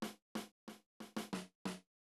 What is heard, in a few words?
Unused Jingle